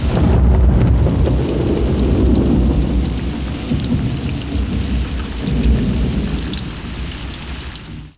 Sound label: jungle, Rain & Thunder; SFX